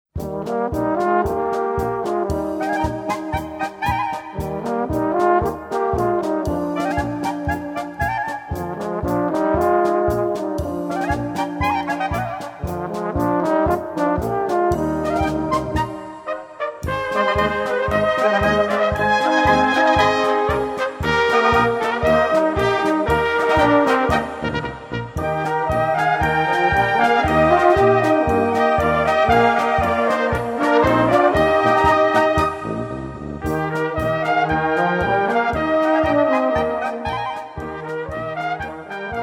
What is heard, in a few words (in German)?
Goldene Tenorhörner